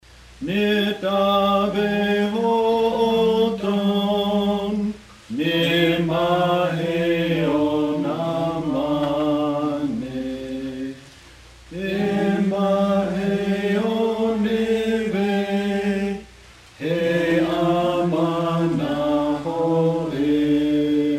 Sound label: Netaveho'otone Nema'heonamane, hymnbook